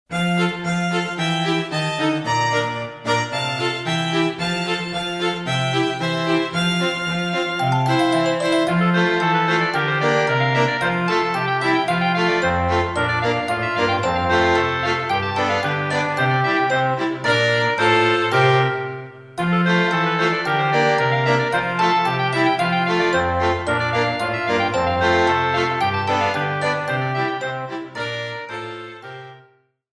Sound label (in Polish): Pastoraka Sdecka instrument; Koledy